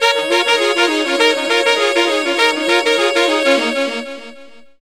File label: Sax Section Effect, effected sax section